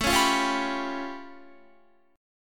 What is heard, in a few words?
A Augmented 9th A, Guitar; A+9 strummed; 16,16,17,18,19,19